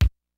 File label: kicks snare, kid; Kid Nepro; Elite; KICKS SNARES